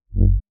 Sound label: Bass; Torino 98